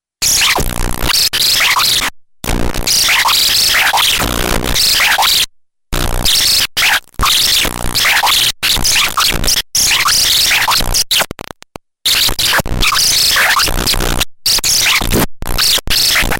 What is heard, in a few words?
Cell Bend